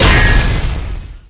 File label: blade slice